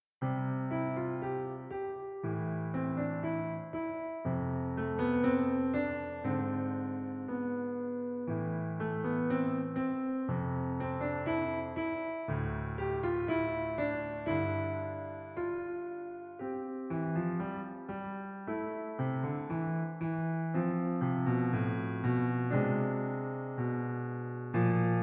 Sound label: Overlapping Waves